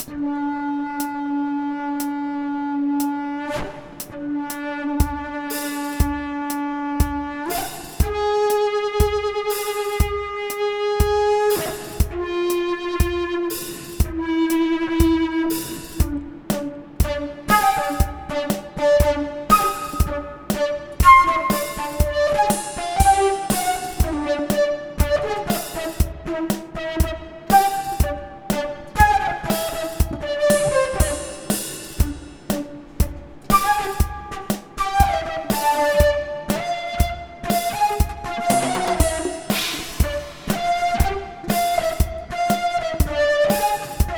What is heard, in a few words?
metal Flute